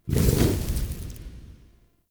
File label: Idol Mode In; Powers; Orengall